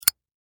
Fire Zippo Lighter Close; Flames; Objects; SFX Bible

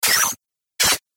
Sfx Scifi Zappy Noises

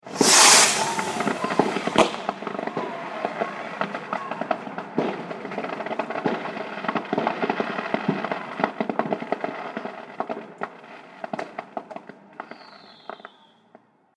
Fireworks New Year Celebration; Firework Sound Effect; Celebration, Party, Holidays

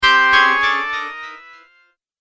Tap Up Delay, Button Sound Effects; multimedia sound effects

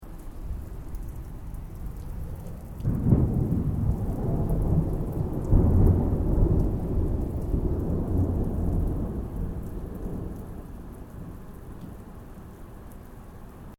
THUNDER DISTANT STEREO; Elemental weather effects

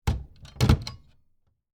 Cabinet Door Close, Antique; Household; Cabinet Doors

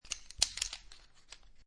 Poker Chips Handling And Moving Chips; Gambling; Casinos

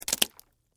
Splat Drop Watermelon, Flesh, Small, Fruit; Texture; Drops; BlastWave

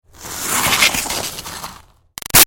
Snow Slide By Icy Scrape Bumpy; Skiing and Snowboarding; Sound Effects:Sports; Big Room Sound

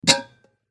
Teapot Pick Up, Teapot Lift Pick Up Metal Tea; Foley Tea Kettle; Household; Dishware

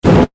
Flame Booster Short, Burst; Spacecraft; BlastWave

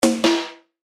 Chime Percussion

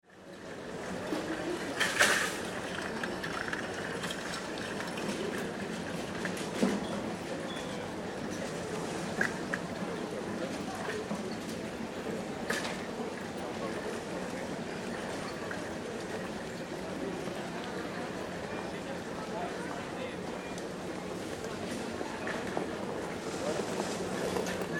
Ambience Airport Denmark; Airports; Backgrounds

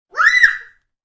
Child Quick Scream, Child screams; Screaming; Voice

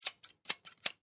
Office Scissors Open Close Three Times; SFX Bible 6

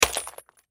Bullet Shell; Bullet Shell 7mm Drop Debris; Drops (Sound Effects:Objects); Blastwave; Warfare

